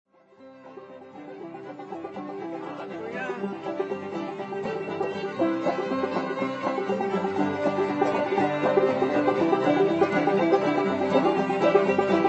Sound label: Key of C; Gideon; Minori Dulcimer & Autoharp Festival; Old-Time JAM